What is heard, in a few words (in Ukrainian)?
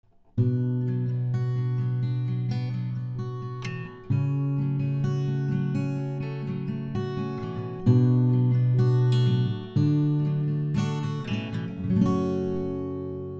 gitara; Lewitt 440 Pure; Тест Гитара; Arturia MiniFuse Recording Pack